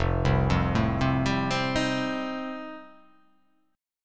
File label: F 9th F, chord